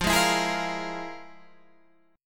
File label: F Minor Major 7th Double Flat 5th, Guitar, Standard; FmM7bb5 strummed; JGuitar